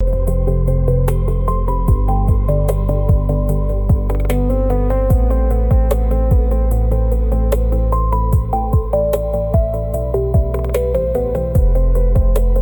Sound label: loop; bgm